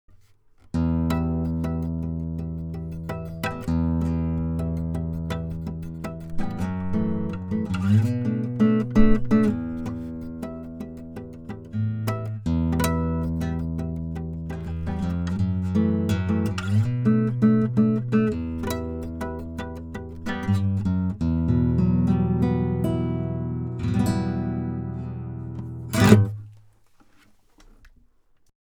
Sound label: Black Lion Audio Auteur Quad Nylonstring km 184